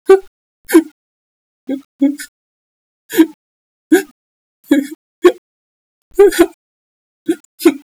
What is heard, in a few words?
crying